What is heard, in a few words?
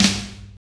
KIT; Roland - LA Composer vol. 2